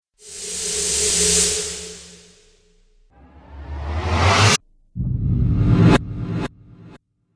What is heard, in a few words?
Cinematic Riser Pack; Rise And Dive Sound Effects